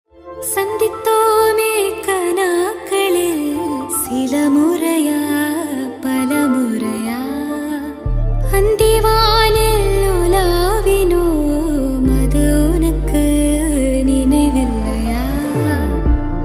singing 

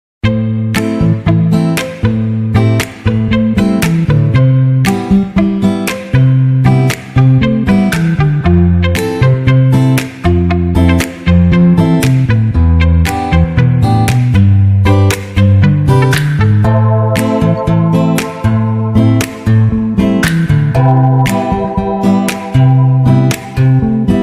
bgm ringtone english music

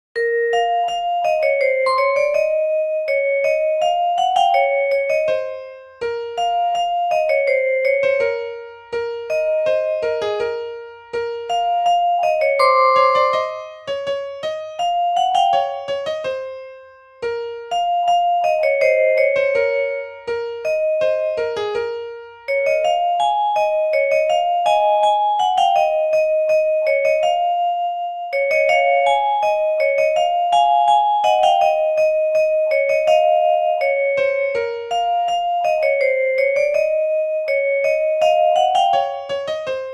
emotional BGM Beat Bounce Telugu Tamil Music; nanna; deiva thirumagal

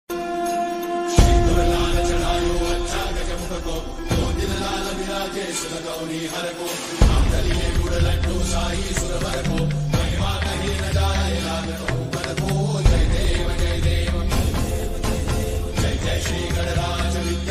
ganesh ringtone; shindur lal chadhayo gajmukhko; ganpati

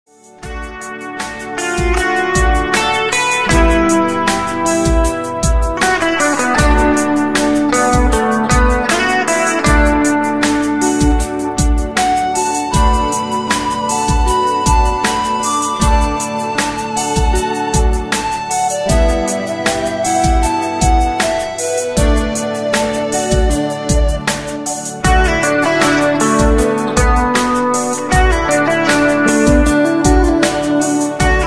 jesus ringtone emotional bgm christian ringtone

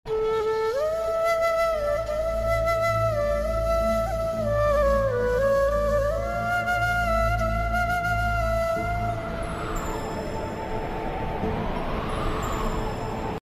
krishna flute radha krishna

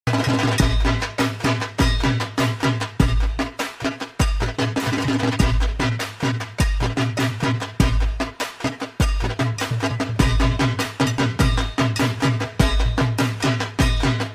dhak music durga puja

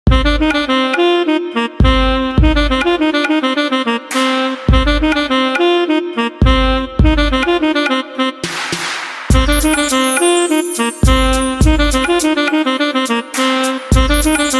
bgm piano; gangubai; earfeast

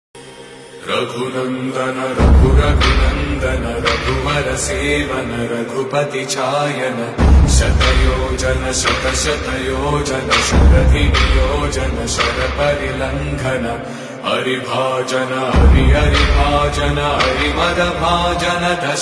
raghunandana raghunandana ringtone

